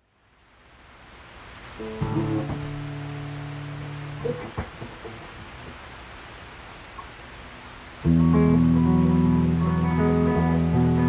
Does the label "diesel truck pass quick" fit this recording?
No